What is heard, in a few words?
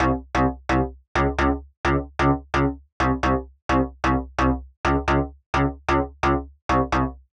BEAT METAL